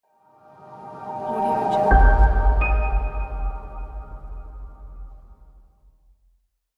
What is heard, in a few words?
intro logo